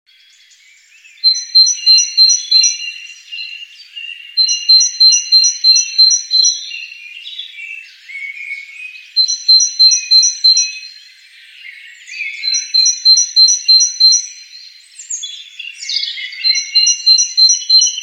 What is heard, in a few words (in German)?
kohlmeise